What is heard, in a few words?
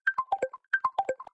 filler loop